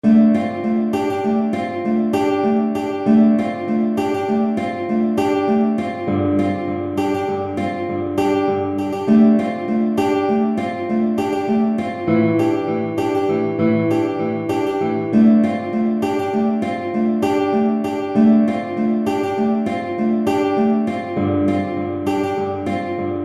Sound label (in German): gitarre